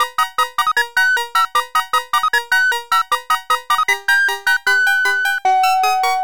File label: Game Over; Pac-Mania